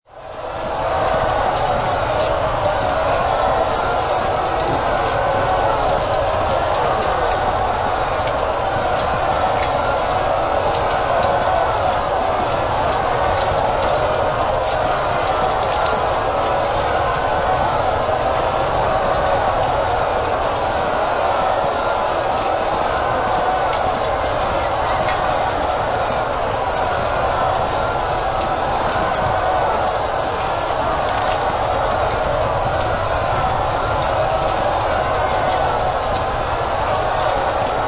Warfare Medieval Battlefield Weapons Horses Soldiers; LYN083 Warfare Battlefields; Lynne Music